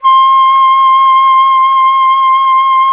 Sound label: FLT FLTC, Flute; Miroslav Vitous - Solo Instruments VOL-2